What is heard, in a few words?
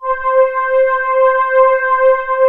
TGANALOG C6; Best Service Dream Experience